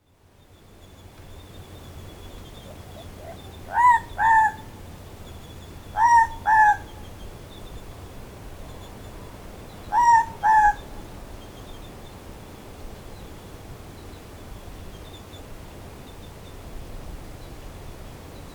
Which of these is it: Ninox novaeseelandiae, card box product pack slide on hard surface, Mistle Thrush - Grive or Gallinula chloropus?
Ninox novaeseelandiae